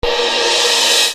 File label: Hat, EuroDance